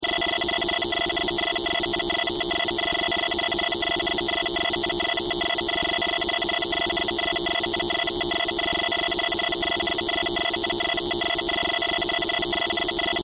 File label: Science Fiction Computer Process Beeps; Computers; SFX Bible